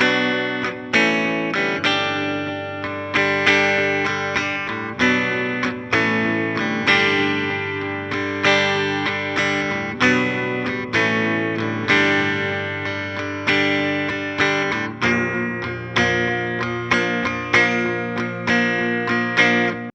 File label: guitar bridge